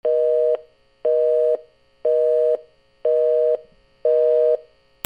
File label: PHONES